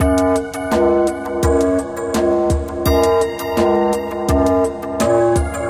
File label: Pause Menu; Ice Breaker